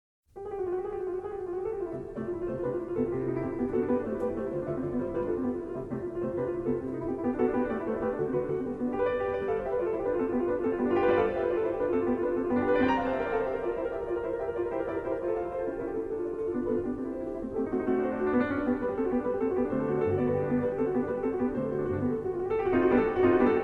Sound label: Spinning Song In C Major Op.67, PIANO; Masters Of The Roll, Vol. 27